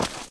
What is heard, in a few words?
mud, footsteps; hl2